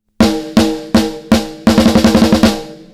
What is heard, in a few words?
Snare harmonics in out